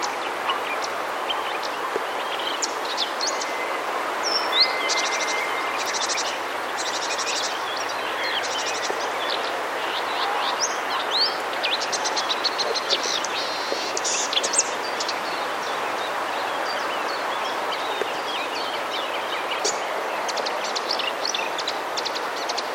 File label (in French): rousserolle verderolle